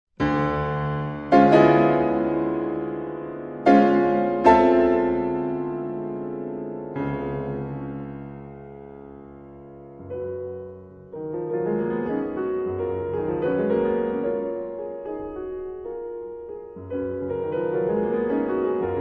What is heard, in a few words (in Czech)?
Preludium Fis dur klavirni verze